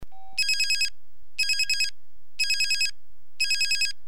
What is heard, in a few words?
Motorola Startac